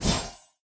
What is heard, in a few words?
close combat cold sweep; CrossCode; battle